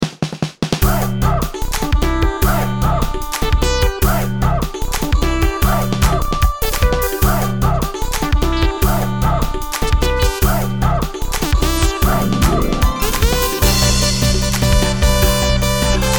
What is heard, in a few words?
bgm settings